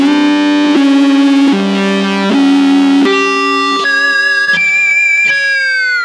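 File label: extra lead (weird melody); Sonic Foundry (Sony Creative Software); Ugly Remnants VOL-2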